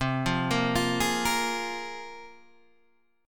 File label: C 7th Suspended 4th Sharp 5th, Guitar, DADGAD